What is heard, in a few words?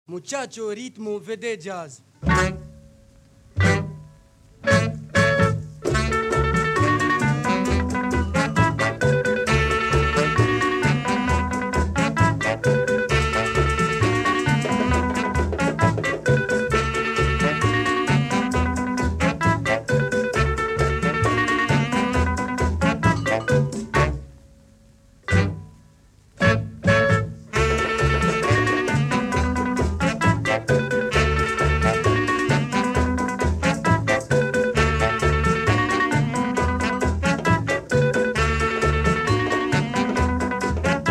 Jazz Orchestre